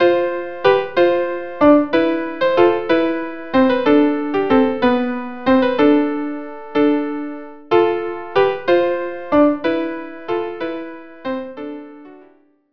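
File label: violin violin violin viola viola viola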